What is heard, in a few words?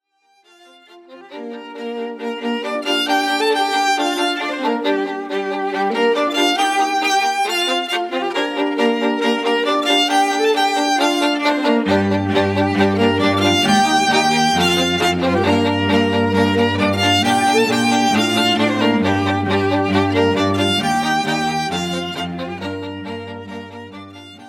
strings